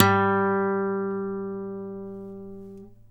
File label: GTR G2 HARD, Nylon Guitar